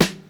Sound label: raw steel snare drum f sharp key